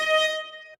ORC D#6

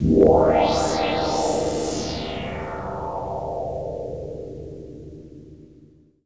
pad; osu

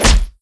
impact

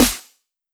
snare; osu

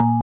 BELL

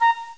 pipe

